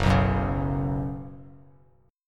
F sharp Suspended 4th, Bass Standard; strummed; F#sus4

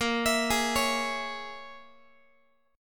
Bb Minor 7th Flat 5th, Guitar; Bbm7b5 arpeggiated

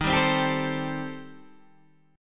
Eb 6th Flat 5th Eb, Guitar DADGAD; 13,10,10,12,12,10

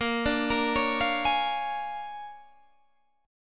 A sharp 7th Sharp 9th A sharp down Guitar Open G

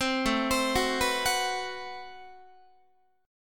A Minor 6th Add 9th, Guitar; Am6add9; arpeggiated; chordshape; 15,17,15,16,15,17

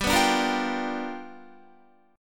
G 7th Sharp 9th G, Guitar; G7#9 strummed; JGuitar

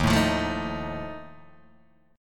D sharp Suspended 2nd Flat 5th, Guitar, Open D

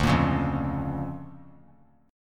D Minor Major 7th Sharp 5th, D; DmM7#5 strummed; JGuitar

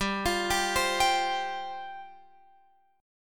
G 7th Suspended 4th G down Guitar Open F; Listen to G7sus4 arpeggiated; G7sus4 Chord

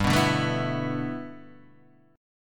E 7th Suspended 4th G, guitar; E7sus4/G strummed; JGuitar

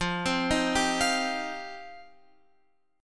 F 7th Suspended 2nd F down Guitar Open F; F7sus2; arpeggiated

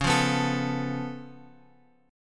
C sharp Augmented 7th C sharp, chord; C#+7 Chord {X 4 3 4 X 5} strummed

